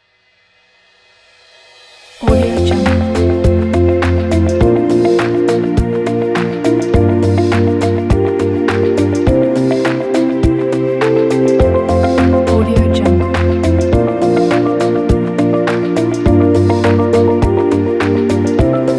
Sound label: happy ident